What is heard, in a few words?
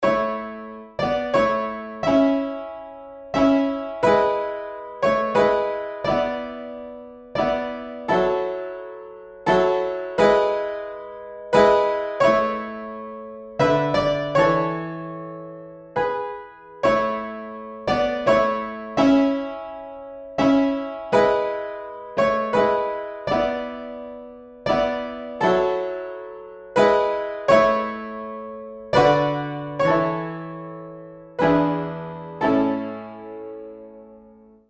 piano; la flute enchantee